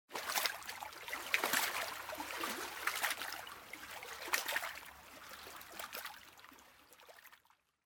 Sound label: Boat Water; Age of Empires III; cinematics